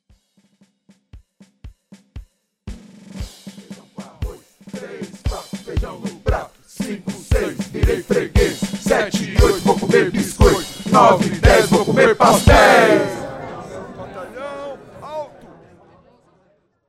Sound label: fade in